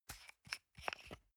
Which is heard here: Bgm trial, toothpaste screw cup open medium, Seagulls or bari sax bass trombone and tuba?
toothpaste screw cup open medium